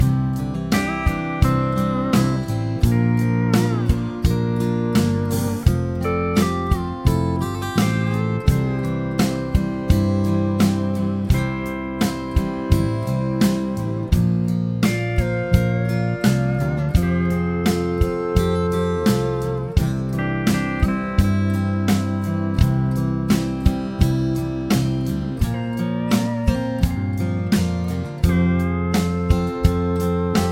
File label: chord prog with mixture